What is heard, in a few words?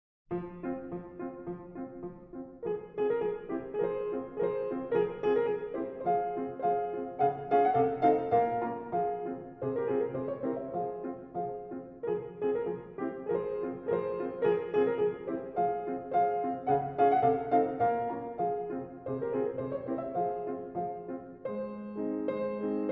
Grand piano; P 284 Mistral; PETROF